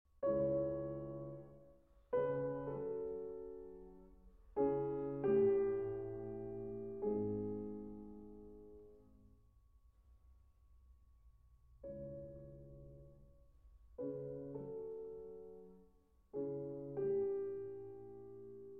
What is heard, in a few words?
Nocturne in C-Sharp Minor, Lento Con Gran Espressione